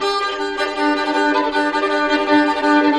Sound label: violin; fiddle